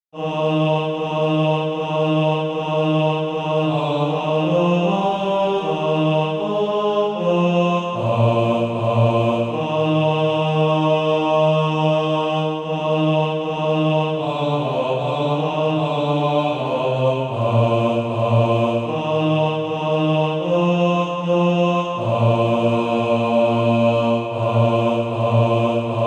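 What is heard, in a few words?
bas, Practise Music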